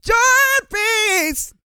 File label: GOSPEL; Vocal Planet Vol1 Gospel